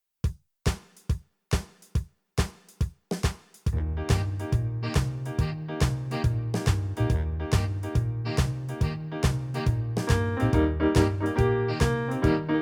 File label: backing